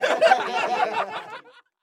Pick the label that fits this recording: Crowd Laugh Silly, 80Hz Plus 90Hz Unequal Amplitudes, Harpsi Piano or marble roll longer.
Crowd Laugh Silly